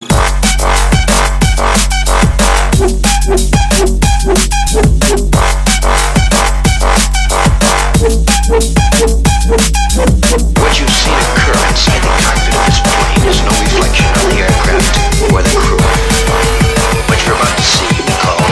drum n bass phat

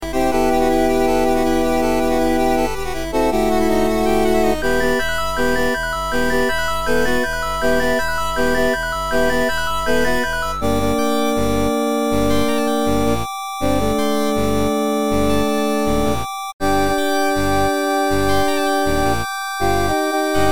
8bit

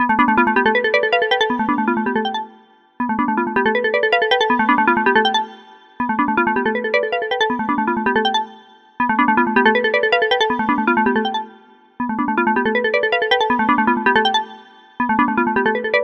Alert

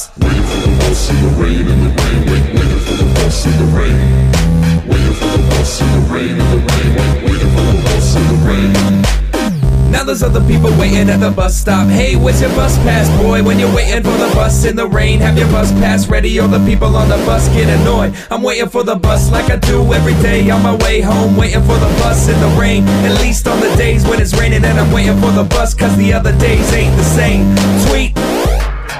Tetris Music

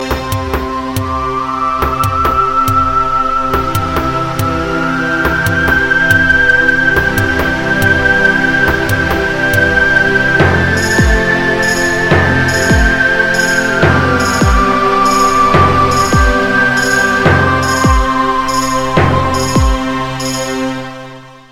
Is Calling Pop

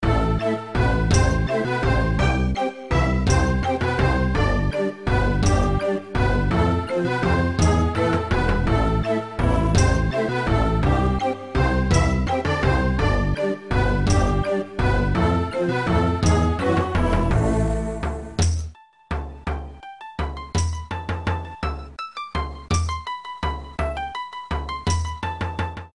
Settlers retro

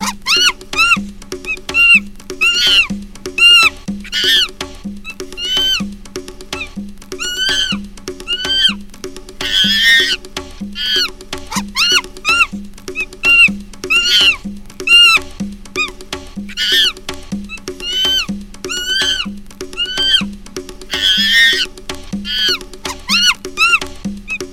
chimp; animals

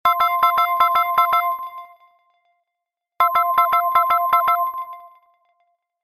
Electron Beam

